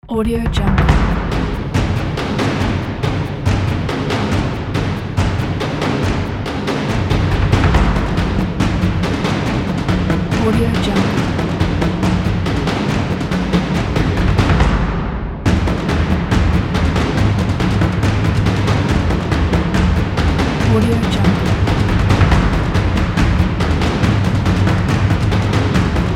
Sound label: epic stomp